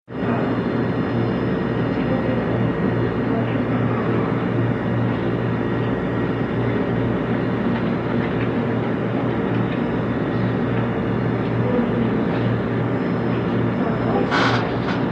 HOSPITAL EQUIPMENT HOSPITAL: Pediatric intensive care unit, nursery ambience.